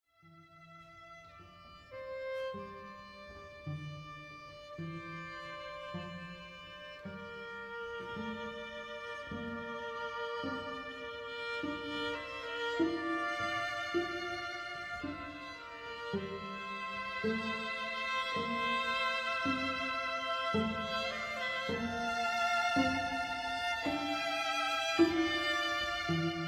piano 4th mov; Shostakovich

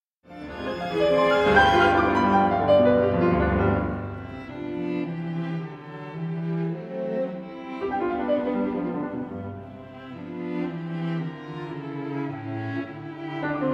piano; Brahms - Piano quartet in C minor op. 60; Festival Montalcino 2012

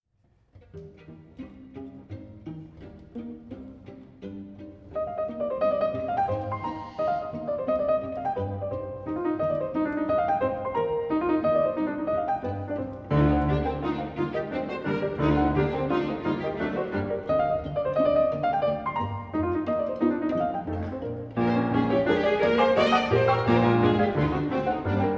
piano; Fauré - Piano quartet in C minor; Festival Montalcino 2012

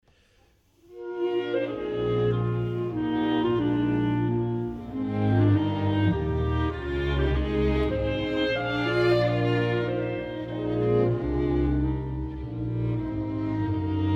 Clarinet Sonata 3rd mov

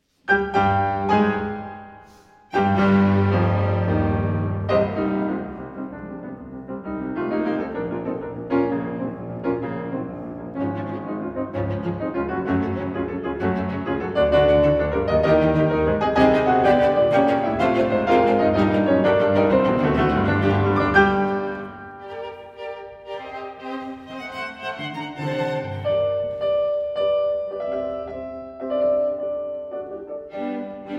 piano; Brahms - Piano quartet in C minor op. 60; Festival Montalcino 2012

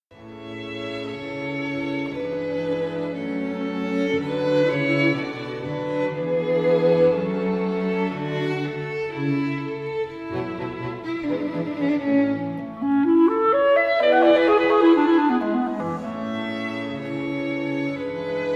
clarinet 1st mov; Mozart

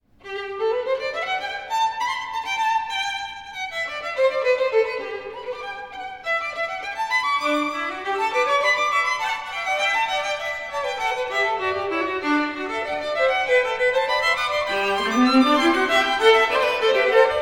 Puccini Fugue

